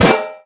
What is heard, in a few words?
metal solid impact soft, metal physics; Source Engine; video game